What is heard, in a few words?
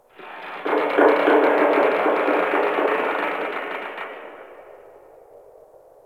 Ocean Underwater Creaks Medium; Ambience; Warframe; Grineer Ocean